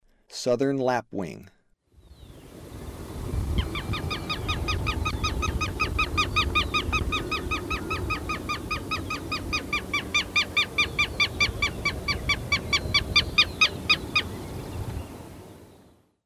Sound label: Southern Lapwing, Birds of Ecuador; S. America